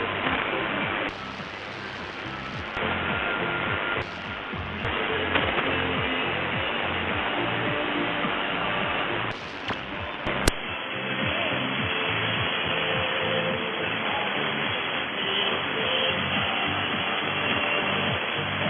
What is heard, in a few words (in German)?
Hollaendischer Pirat AMS; 6140khz